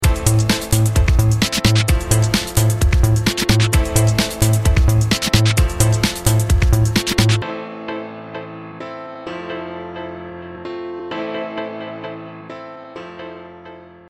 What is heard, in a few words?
Beat Guitar